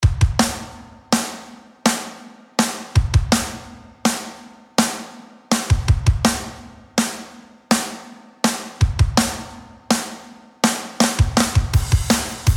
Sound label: valentine drums